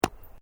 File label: Sport Ball Rubber Bounce Hand Grab, Rubber ball; Bounces; Objects